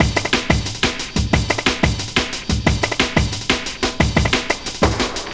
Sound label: Pool Hall Beat, Drum & Bass; Fluid Dynamics